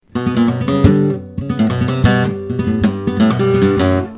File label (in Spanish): falseta